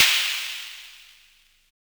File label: Snare, Univox SR-95